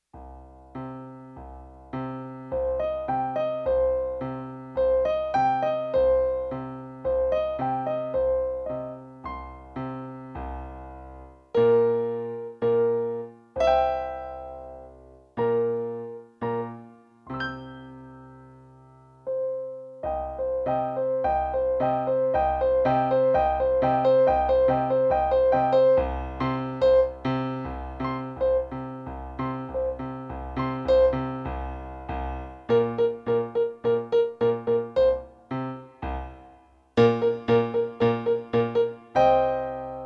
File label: piano prelude